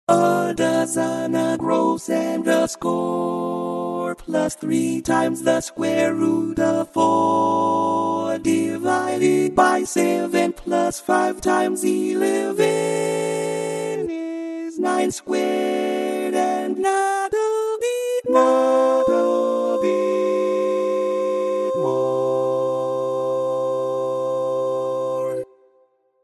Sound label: Tenor; Limerick